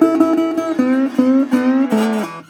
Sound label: 120, Blues Guitar; ILIO - Fretworks